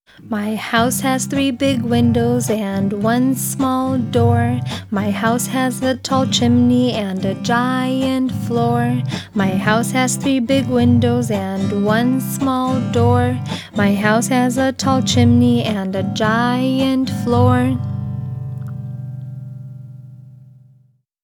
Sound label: my house has three big windows piosenka